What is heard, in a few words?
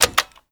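door chainlink electric button; Doors; ModernWarfare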